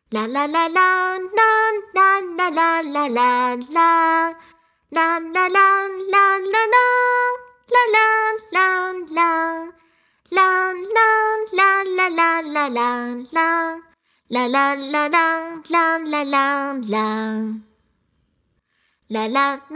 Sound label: Singing; the legend of zelda skyward sword wii gamerip 2011